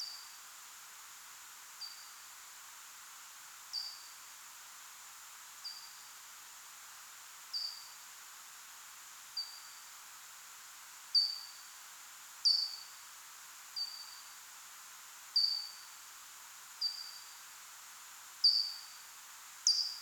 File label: buzz Pippip bad sounds